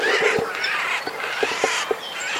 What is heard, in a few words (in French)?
geai des chenes